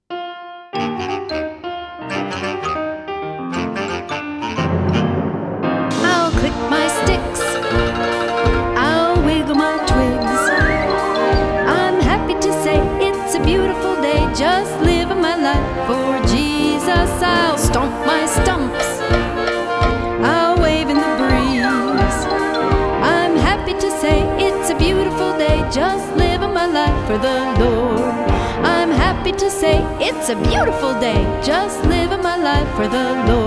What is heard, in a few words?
Tabla